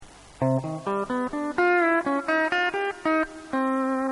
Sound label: Minor Two